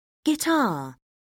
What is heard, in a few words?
guitar; Oxford Word Skills Intermediate